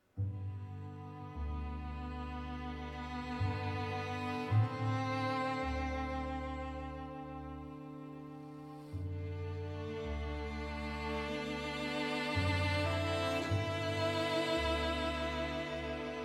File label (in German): mahler symphonie nr 4 g-dur satz 1